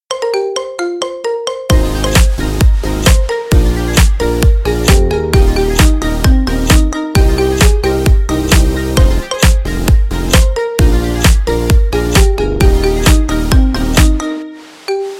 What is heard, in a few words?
fancy marimba remix